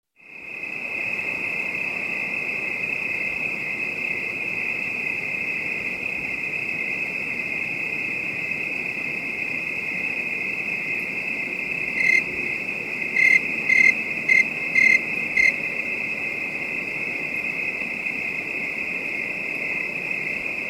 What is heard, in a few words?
Night Ambience Calm Distant Bed of High-pitched Crick; Nature Ambiences